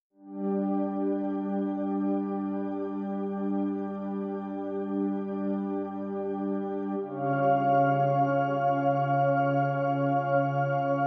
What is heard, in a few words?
Space Ambience